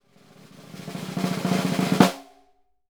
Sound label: SNR, Synclavier Percussion 2 - World & Orchestral; ILIO